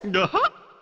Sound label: Banjo Guh Huh